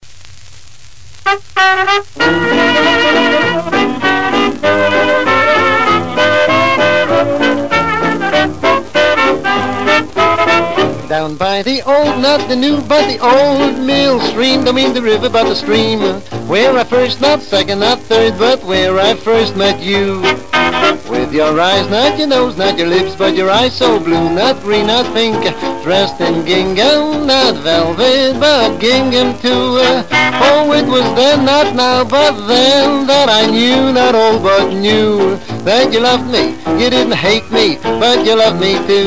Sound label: double entendre party record; 1940s